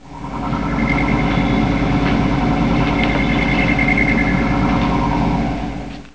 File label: ambient